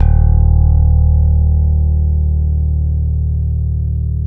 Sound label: PRECISION BS, Bass, E 2; Spectrasonics; Bass Legends